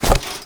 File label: Land Cage